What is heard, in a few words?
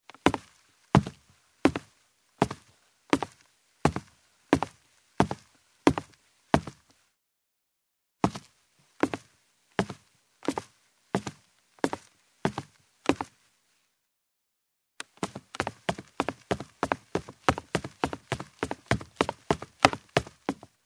Steps on a wooden ladder in shoes